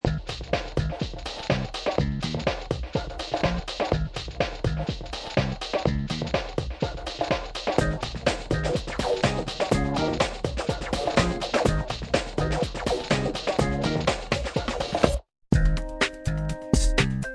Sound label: comic